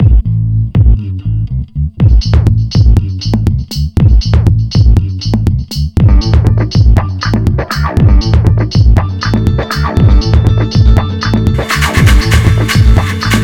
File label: 70s Funk